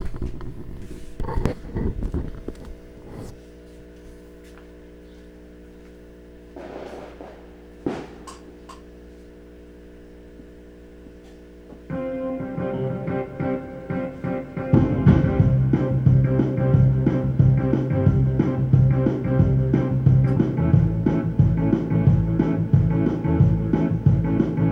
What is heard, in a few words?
rock